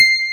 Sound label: CP70 D#5